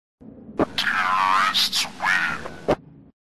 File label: win